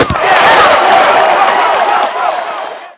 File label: goal score